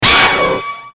Hit Flange Sweep; Sound Design; Blastwave

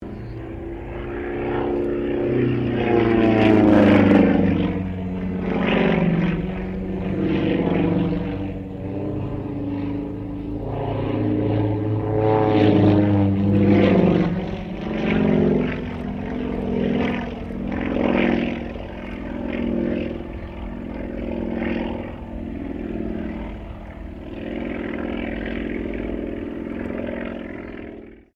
Sukhoi Su-26M acrobatic pass; SU-26m Acrobatic aircraft; Airplane; Vehicles

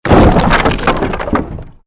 created wooden structure crash medium

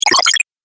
Game Wet Robo Chirp; video game

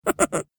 Cartoon Gremlin Chortle; Cartoon Voice

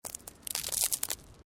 Stone Small Move; Material components for constructing more complex sounds; Rocks; Sound Effects:Materials

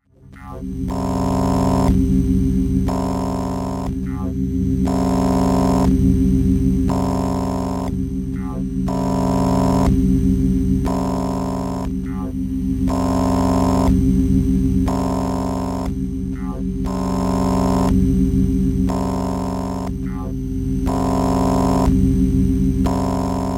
Futuristic space laboratory

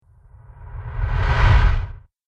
Medium Deep Whoosh

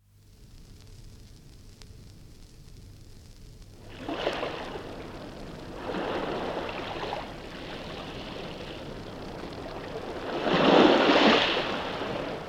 Waves lapping on sand, from 78 disc; Ocean; Nature; BBC

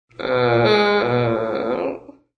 Woman Whines, A woman whines annoyingly; Whining; Voice

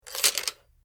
Spring Hook Foley Rockclimbing Clip Slide; Latch; Latches

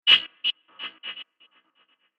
Robo Mechanic Droid; Robot Sound Effects; science fiction sound effect

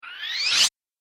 Cartoon Slide Fast; Cartoon Sound Design Sound Effects

